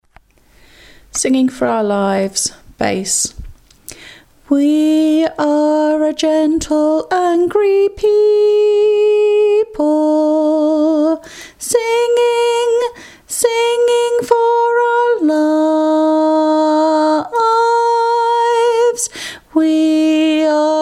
bass

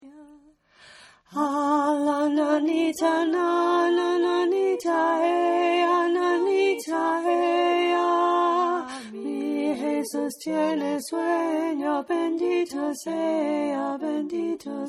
Alto, choir

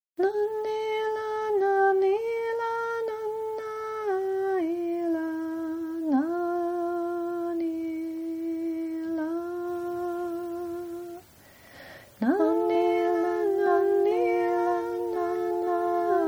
Tenor

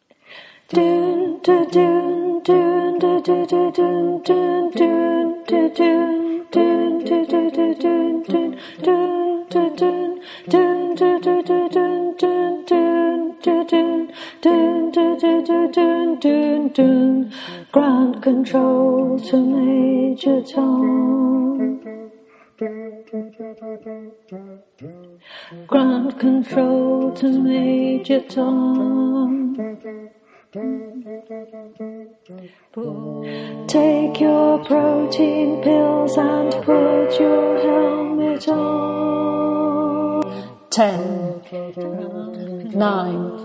Alto

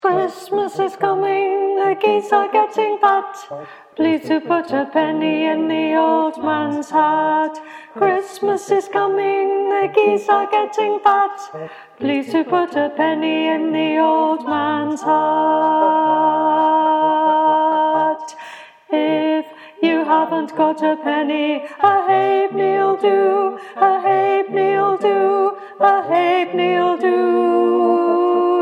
Alto

